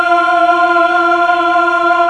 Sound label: MELLO CHOIR